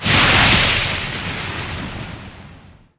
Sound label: fade bolt cast; weapons; dota; rubick